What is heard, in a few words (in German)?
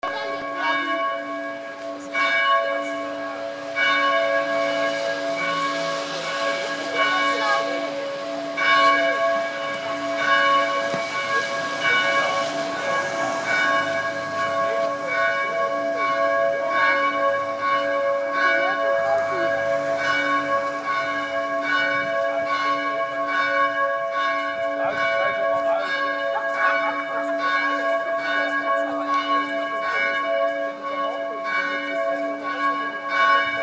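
Glocken Frauenstein Bronze Einläuten Eine Glocke; Tourismus Wandertouren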